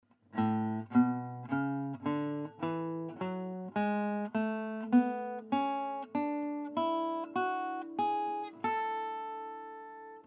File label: A scale